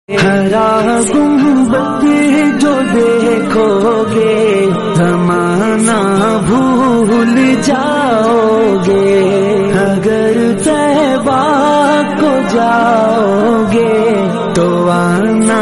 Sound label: naat devotional islamic